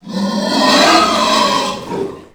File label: pig chase; A Machine for Pigs